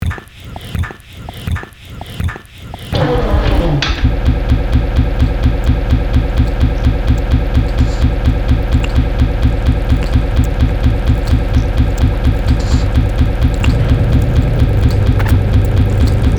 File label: Grunt, Main Body